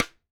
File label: Rim Acoustic Lite